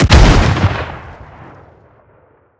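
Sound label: concussion explosion body; gmod; viper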